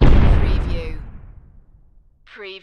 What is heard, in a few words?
Explosion Short; Action, Impacts & Explosions